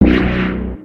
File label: Not Enough Cash; aronwk collection; ndaudio